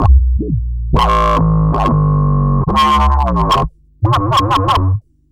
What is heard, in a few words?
Future Bass; Fluid Dynamics